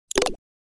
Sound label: Menu button click